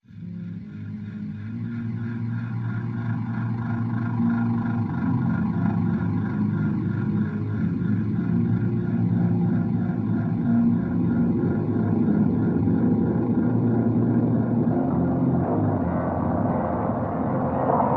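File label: Drone Locust Nest Low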